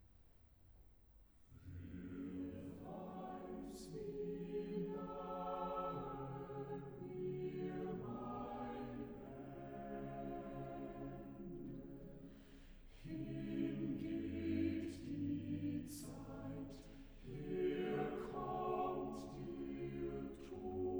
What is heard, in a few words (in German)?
Choral; Cantata, BWV 166 'Wo gehest du hin'; 'Wer weiß, wie nahe mir mein Ende'; Bach; Warwick; 4th Sun After Easter